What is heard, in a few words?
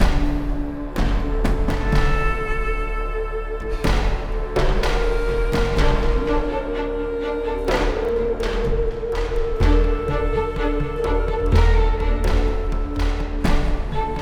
Battle Medium Loop; The Golden Compass; Samoyed